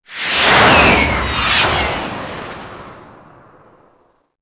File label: bomber move; svencontra2; stage2